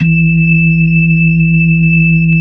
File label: B-3, Hollow Ch; Roland LCDP10 Keys of the 60s and 70s 2